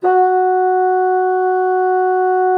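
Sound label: bassoon piano F#3; Xsample VOL-6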